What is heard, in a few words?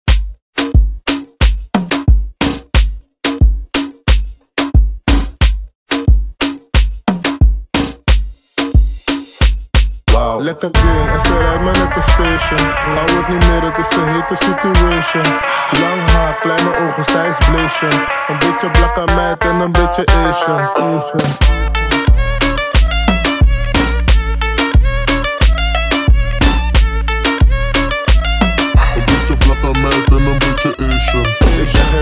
remix bpm 90